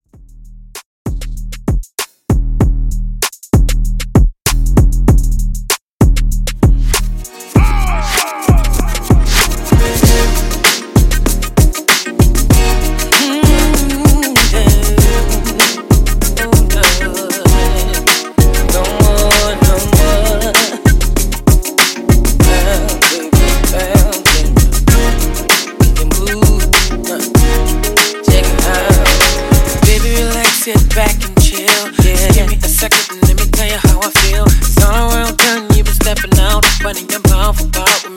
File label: Old School Re Bass 97Bpm